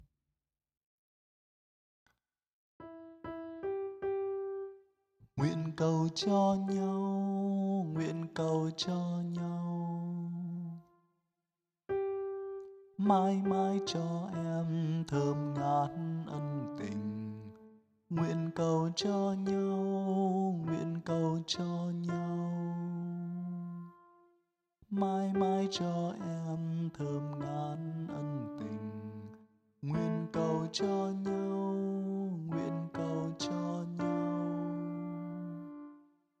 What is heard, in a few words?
Tenor Bass